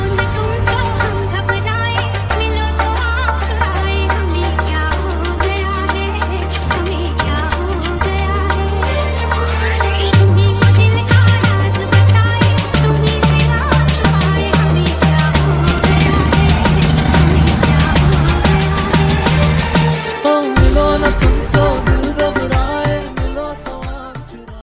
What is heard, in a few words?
bollywood music ringtone